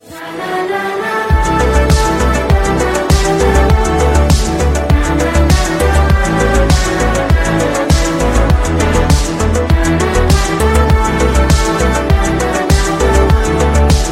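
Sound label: background music ringtone; MAMMOOTTY SQUAD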